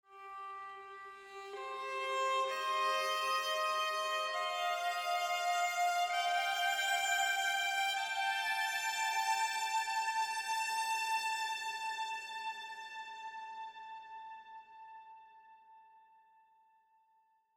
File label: Exploration; horizon zero dawn; Mesa Outskirts